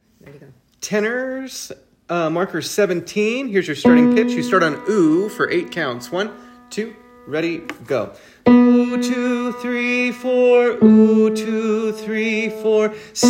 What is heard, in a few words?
Tenor, choir